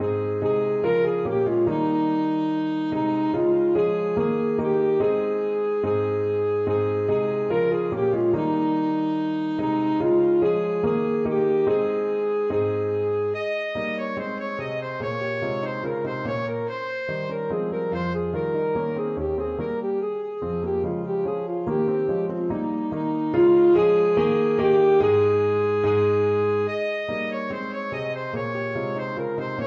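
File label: ding dong alto saxophone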